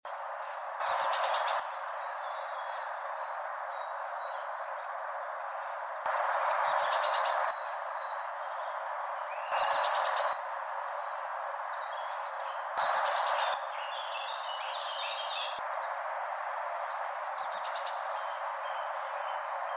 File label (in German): blaumeise; vögel; rufe vögel